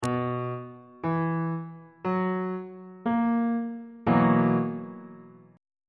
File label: b major 7th suspended 4th chord on bass clef